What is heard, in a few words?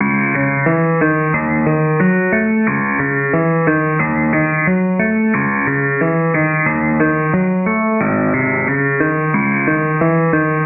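melody keys 90 C# minor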